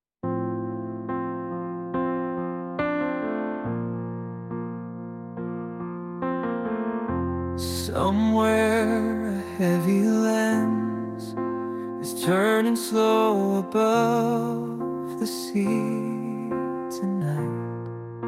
lullaby, name songs; crystal

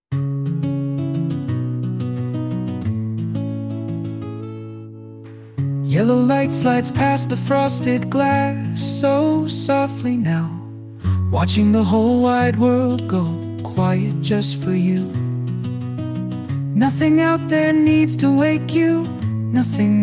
lullaby, name songs; cleo

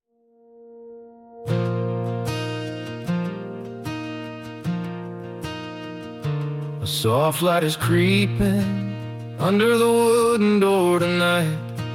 lullaby, name songs; dakota

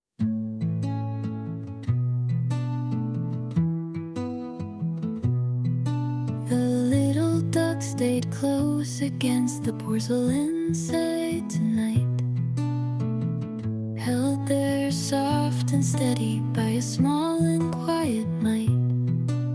lullaby, name songs; cynthia

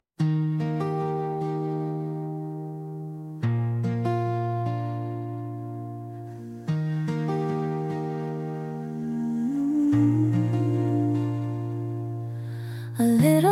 lullaby; wren

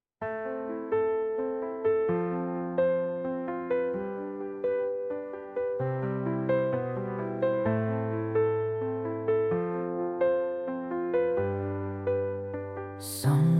lullaby, name songs; ffion

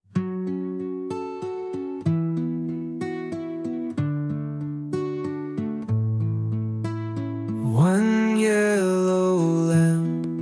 lullaby, name songs

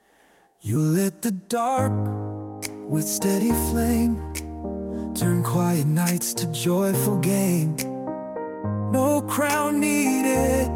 birthday, name songs; cyrus

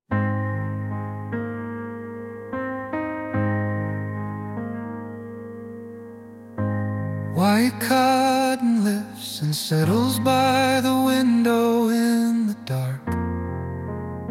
lullaby, name songs; lana

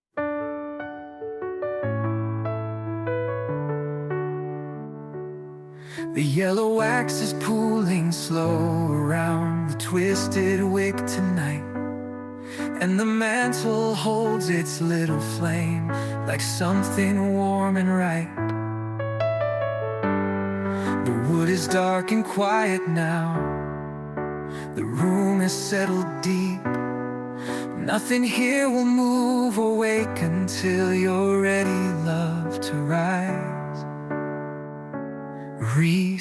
lullaby, name songs

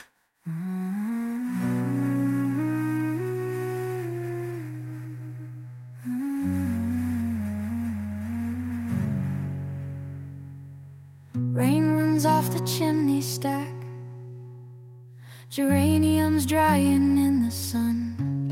victoria birthday

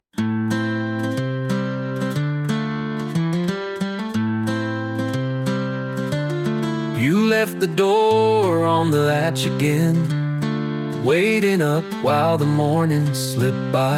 birthday, name songs; clive

